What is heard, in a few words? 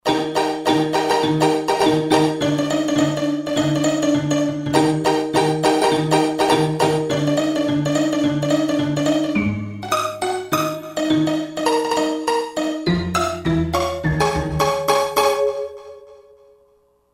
Seq, Roland JD-990; Factory Patches; Seq Hutt